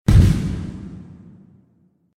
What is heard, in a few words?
explosion; CrazyDriver; Action Games